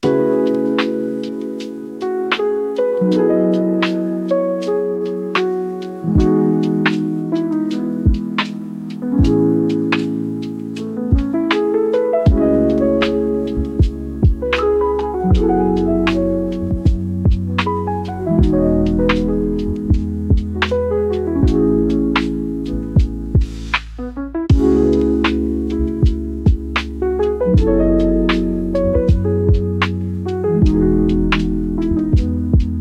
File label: Coffee Rain – Cozy Lo-Fi Vlog Beat